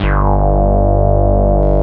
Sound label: Synth Bass, Analog Bs